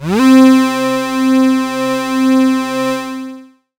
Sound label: syn c, Dance Synths, Juno; Juno Jam